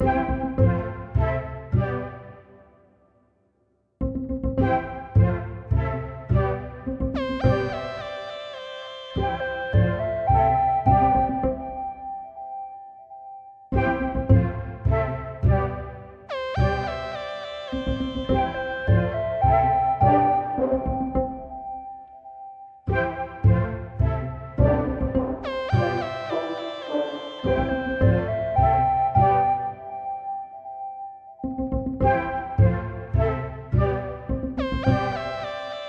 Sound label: Loop No drums 105bpm; jenny leclue detectiv